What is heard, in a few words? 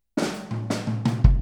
Boogaloo Kick, 82 BPM; Sonic Foundry (Sony Creative Software); Drums from the Big Room The Mixes